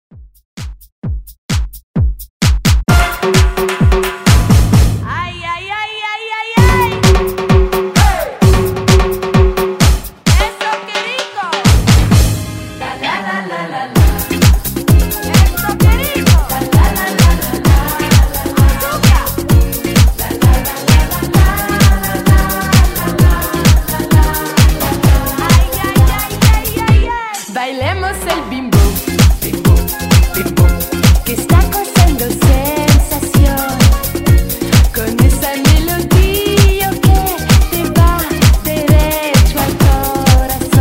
90s Redrum

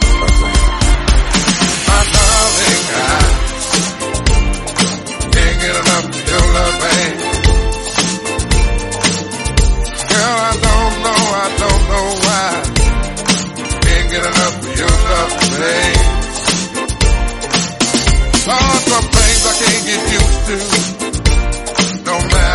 Snippet 113bpm F

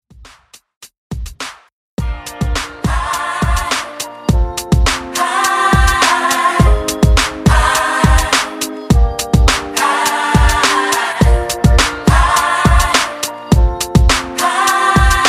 90s Rb Soul Re Drum 104 BPM

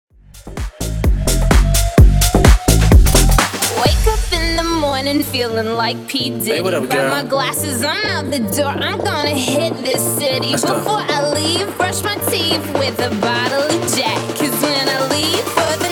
mash up Bass house 128 Bpm 8A

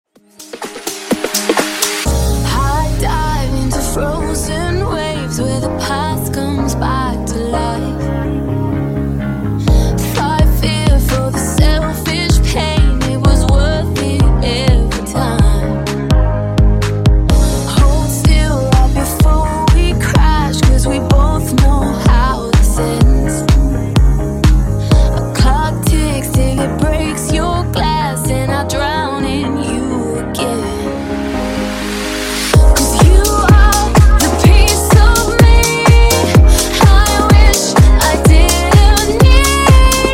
Funk Bootleg 126Bpm

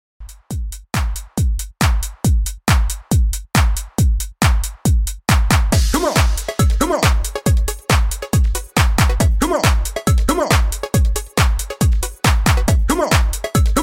Dance 138Bpm

